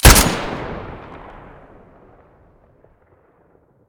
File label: svu muzzle stereo